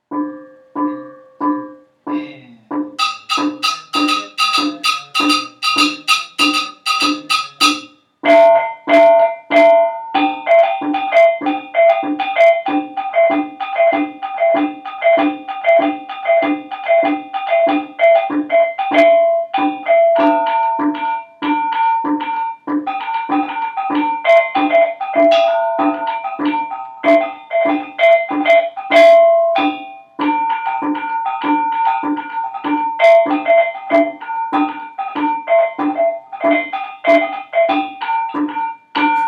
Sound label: Joged Reyong, gamelan